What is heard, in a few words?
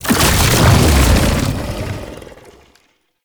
Underground Attack Cast; Warframe; Abilities